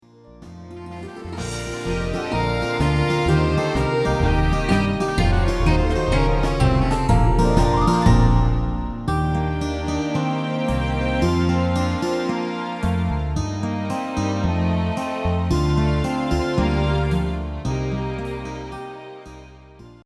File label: Instrumental